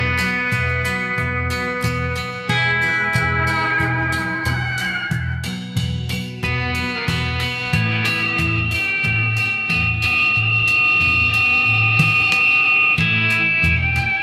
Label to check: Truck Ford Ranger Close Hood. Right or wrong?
Wrong